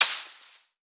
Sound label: Disc Rico Tree; Boomerang Fu